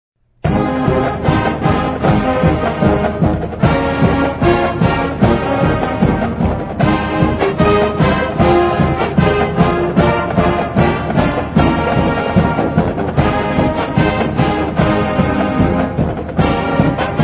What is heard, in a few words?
Growl, Bengals Growl; Idaho State